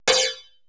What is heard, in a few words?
Procussion; Best Service ProSamples vol.55; Retro Sampler; EMU E-III; Procussion Mix